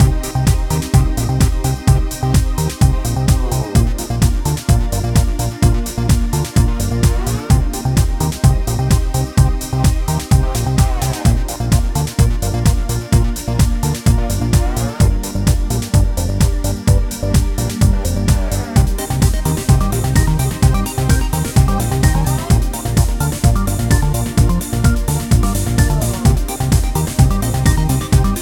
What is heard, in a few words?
Funky Chiptune (Looping), Here's one that might work in a high energy retro-arcade style game.